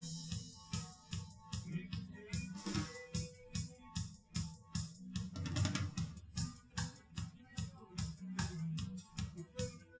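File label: with drums, live rec; SiSEC11; underdetermined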